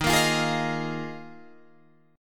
D sharp Suspended 2nd Suspended 4th D sharp Guitar Drop D